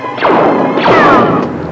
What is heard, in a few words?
blaster sound; star wars